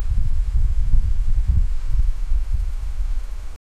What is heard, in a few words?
an american flag flapping